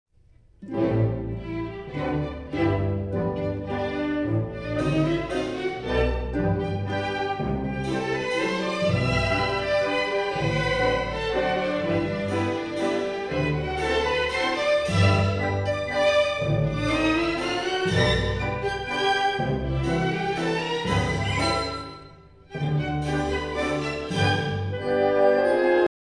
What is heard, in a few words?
Laurencia Female Variation